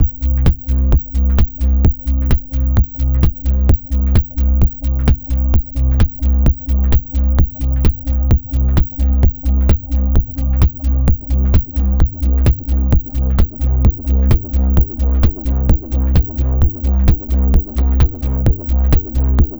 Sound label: Techno; TechnoFabrik; fabrik plus